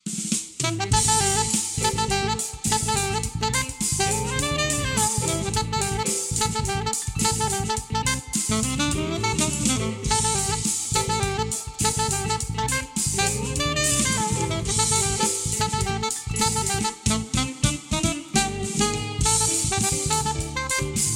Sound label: alto sax